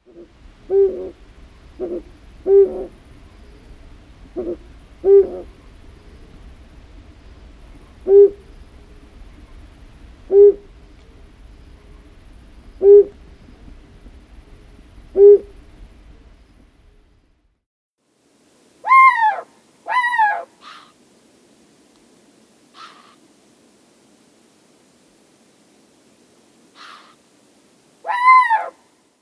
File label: Flammulated Owl